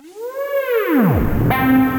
Rave Siren; Effects

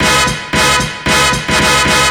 Drums, breakbeats; Head Spins; Ableton; Breakbeats by KutMasta Kurt

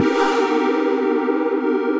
Synth Chord; Synth Lead; Delightful Synth Chord

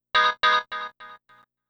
R&B Dub Guitar, G#; R&B Funk Soul

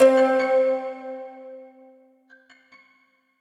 Cigar Box, plucked items; Extended; Instruments; Ableton; Factory Packs; Lost and Found

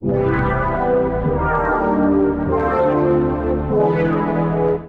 A Minor 75 bpm; MIDI Clips; Music; Accompaniment; Bi-Phase Sector; Ableton; Chop and Swing

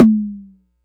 digital pitched, Kickbank; Ableton Foundation FreePacks

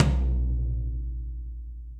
Tom Floor Ambient Loud Punch; Drum Hits; Standard Hits; Ableton; Factory Packs; Session Drums Studio

